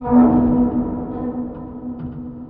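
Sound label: metal scrape reverb; ambient atmosphere; Counter Strike Model Pack; CS:GO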